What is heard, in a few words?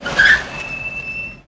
Car Tire Chirp And Brake Squeak